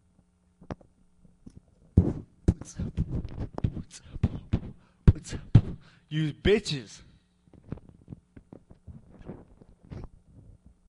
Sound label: Beatboxer; Rubicon 2003 - Saturday; rubicon5